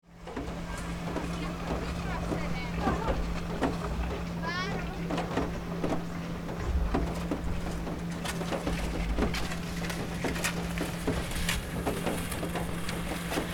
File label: Ski lift in operation onboard chairlift ride